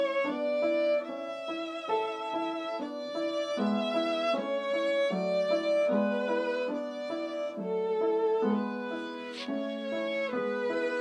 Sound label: humming song on piano and violin